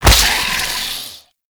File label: Infested Should Fire, Enemies; Warframe; Femur